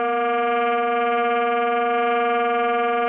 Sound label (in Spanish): Tremolos